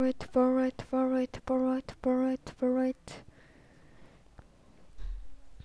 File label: bullet